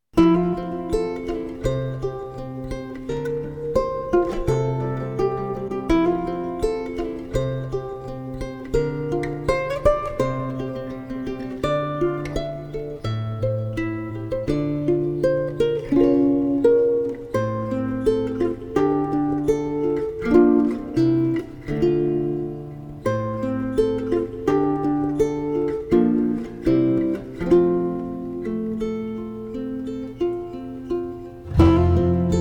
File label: sentimental guitar; Jane the Virgin; Sweet; Acoustic/Soloistic